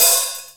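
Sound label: HIHAT OPEN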